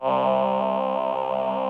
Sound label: VP CHOIR, VOCODER; Greytsounds VOL-1